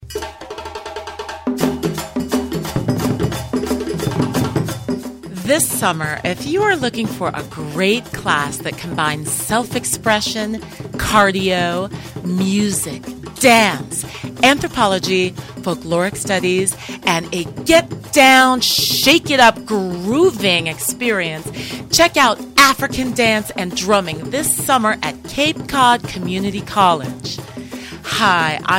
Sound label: african dance and drumming